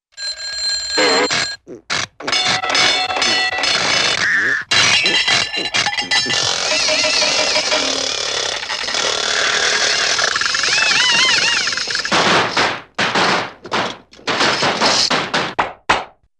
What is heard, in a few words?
Funny Alarm Clock Begins To Ring & Suddenly Goes Wild, cartoon; Hollywood Edge; Cartoon Trax